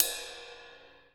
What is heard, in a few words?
ride 1, drum kit; KITS-SMALL